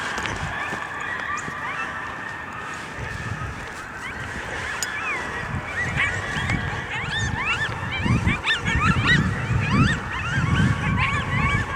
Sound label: howls, amazing echos from just two coyotes